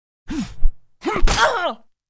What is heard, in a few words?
Slash Single; Goddess of Trampling